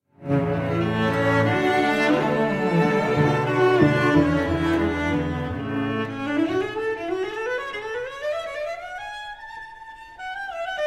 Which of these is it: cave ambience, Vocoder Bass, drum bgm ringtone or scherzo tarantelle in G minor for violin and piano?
scherzo tarantelle in G minor for violin and piano